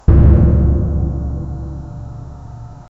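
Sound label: sound of a distant explosion; vintage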